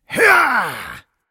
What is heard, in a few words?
Lich effort